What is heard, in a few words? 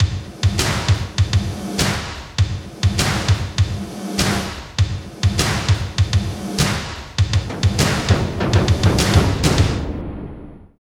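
LOOP, left; East West - Phat & Phunky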